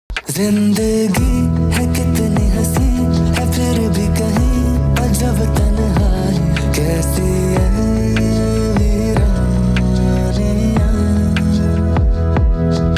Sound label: male pakistani ringtone